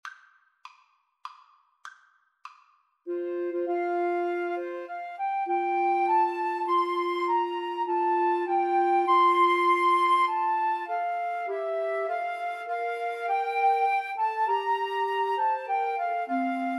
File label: mendelssohn nocturne, 2 Flutes Clarinet